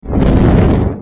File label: time woosh